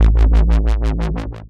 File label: heavy dubstep wobble bass one shot